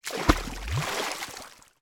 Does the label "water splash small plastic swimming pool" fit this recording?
Yes